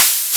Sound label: pulse; nyquist